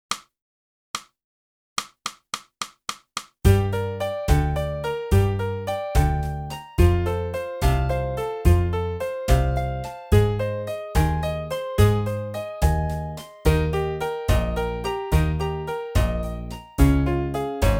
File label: sax